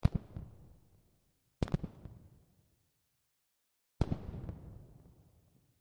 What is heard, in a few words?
Outdoor Shots